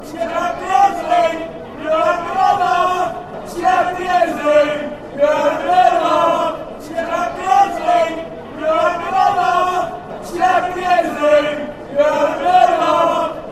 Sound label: yer having laugh, chants